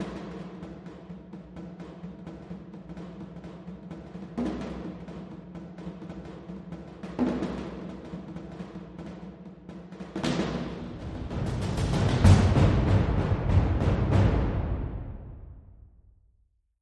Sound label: Dangerous War Drums